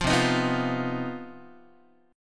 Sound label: C Minor Major 7th Double Flat 5th C down Guitar 4A 4E 5A 5D 5G sharp; strummed; JGuitar